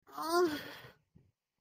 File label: awwwhh